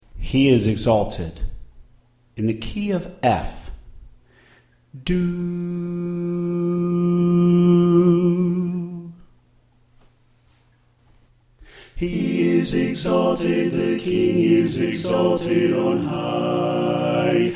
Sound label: Soprano Left